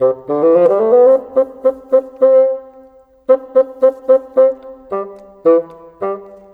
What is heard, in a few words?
Bassoon, Cinematic; Sonic Foundry (Sony Creative Software); Orchestral Series VOL-3